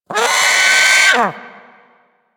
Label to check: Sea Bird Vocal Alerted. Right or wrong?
Right